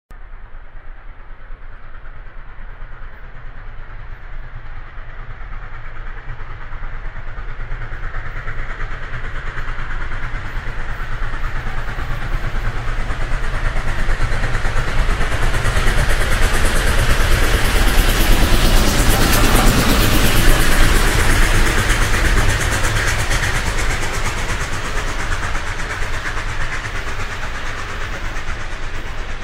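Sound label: 3d sound of train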